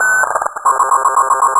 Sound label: Vaccine Comp Ambient; Dystopia